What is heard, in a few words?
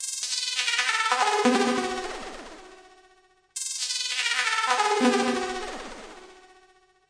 135 Descent Synth; SYNTH AND ELECTRONIC LOOPS